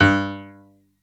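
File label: PNO G1, piano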